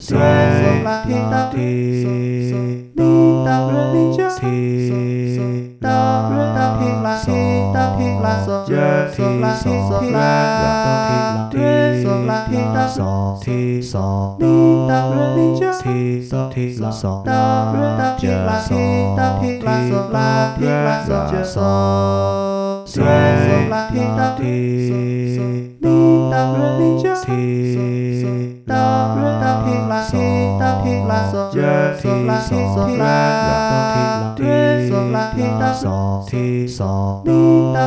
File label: minuet in g major